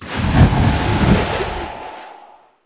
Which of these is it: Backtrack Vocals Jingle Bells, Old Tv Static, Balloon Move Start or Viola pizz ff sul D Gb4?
Balloon Move Start